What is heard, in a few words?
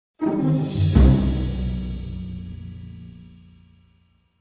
Comedic Sting; Benjamin Newhouse